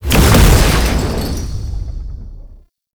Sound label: unholy punch launch start; Roathe; Warframe; Tau; Abilities